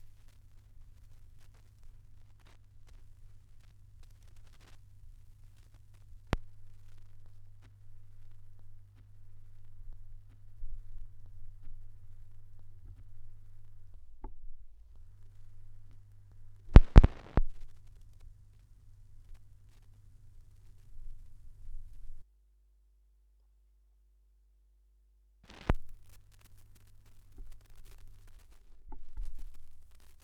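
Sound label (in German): schallplattengeraeusche, record noise; wendelrekords 1.1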